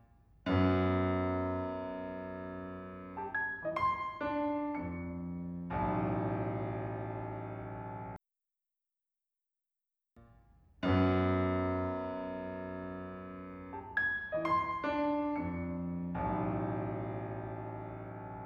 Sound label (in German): Klavierstück; Klavierstück I Version A Takes 1 and 2 bars 3–5 comparison